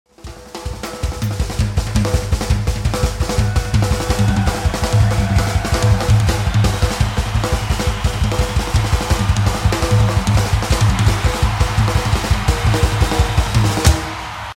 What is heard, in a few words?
restrained double handed crossover